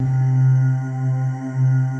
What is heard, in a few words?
PAD C3; Greytsounds; Scott Peers Sound Engineering